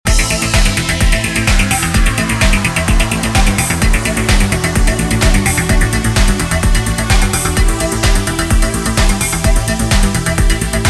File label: Flange Leads and Beats